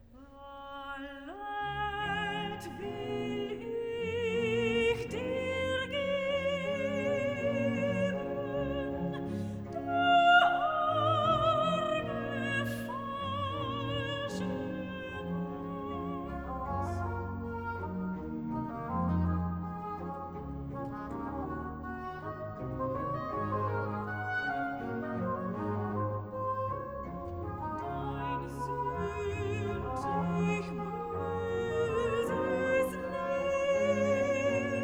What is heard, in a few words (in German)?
Cantata, Choral (Sopran); BWV 95 'Christus, der ist mein Leben'; III. Choral (Sopran) 'Valet will ich dir geben'; Bach; 16th Sun After Trinity